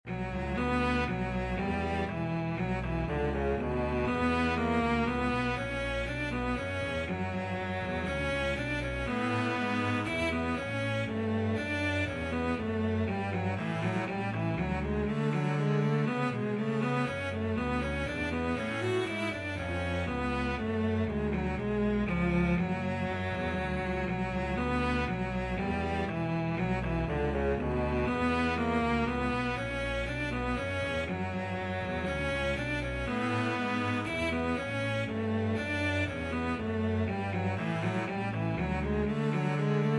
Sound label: cello bass duet; bach bourree 831